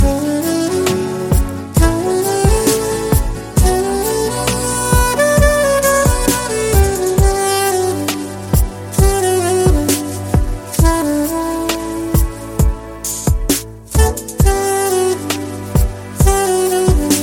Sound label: super sax